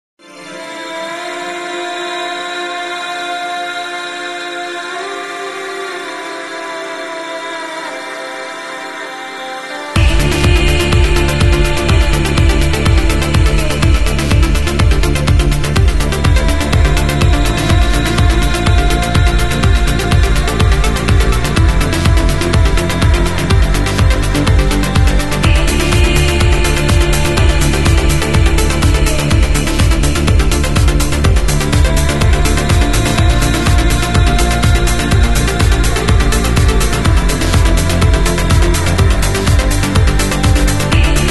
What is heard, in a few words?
Voices; Square Factory